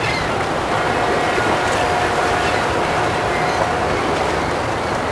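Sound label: Ambient Harbour; Finding Nemo; XBOX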